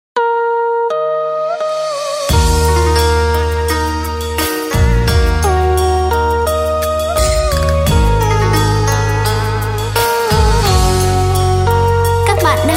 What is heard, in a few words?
beat, playback